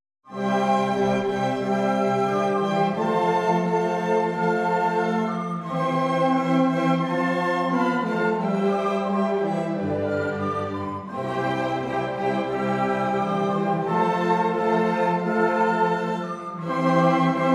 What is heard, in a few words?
nam anthem; namibia; nationalhymne